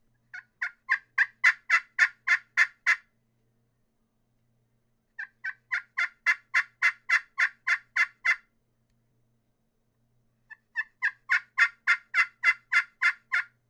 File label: clear crystal yellowheart yelps, turkey; Southland Sounds